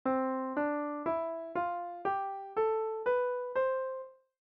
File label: C major scale